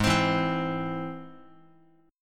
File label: Ab Augmented Ab, Guitar, 4A, 4E, 5A, 5D, 5G sharp, 6C sharp; Ab+ strummed; JGuitar